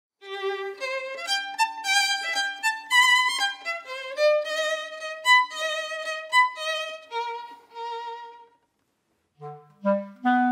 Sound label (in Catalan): Violí